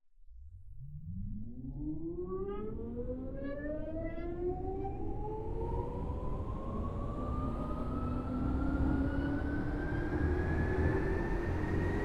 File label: motor noise; tokyu 8500